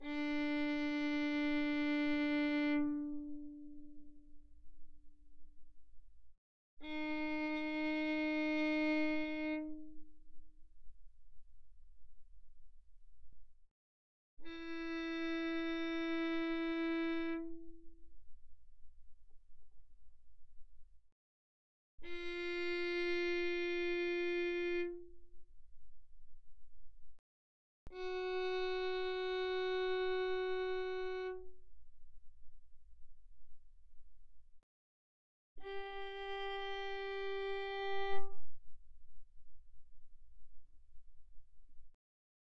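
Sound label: Violin arco pianissimo sul D D4 B4; Strings; violin2012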